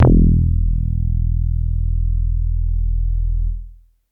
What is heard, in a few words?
KICK BASS; SoundFactory